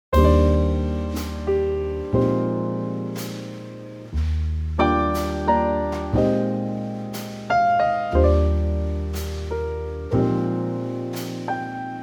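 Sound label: Eb Trio, Backing Tracks for Male Vocals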